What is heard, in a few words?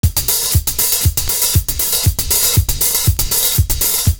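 PLAY THE snare, LOOP; Univers Sons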